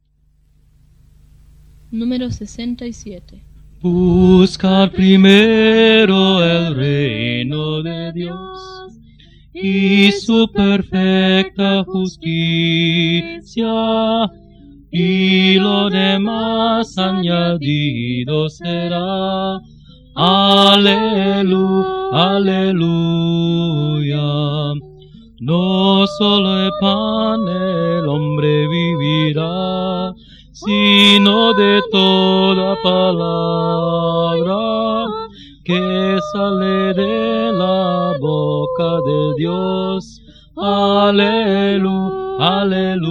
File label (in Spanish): Coro, himnario niños